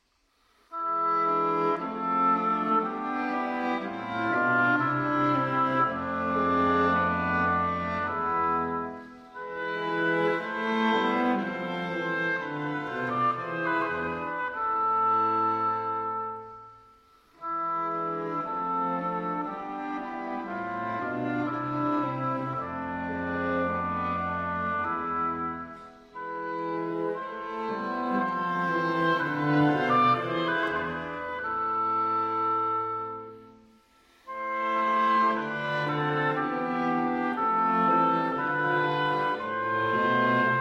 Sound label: Weihnachtsoratorium, Wie soll ich dich empfangen, Arr. for Oboe Qu; Pt. 1 No. 5